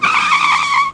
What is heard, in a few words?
skid; Superbike 2001